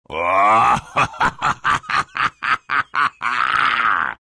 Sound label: laugh; dota; lion